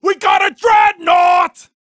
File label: Reaction Mini Boss Roar